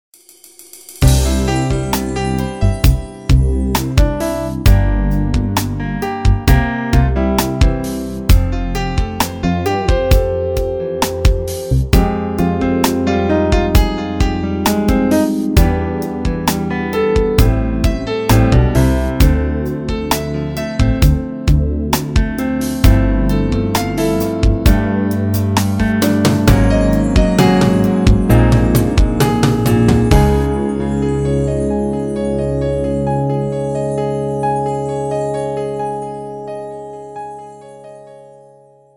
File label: Piano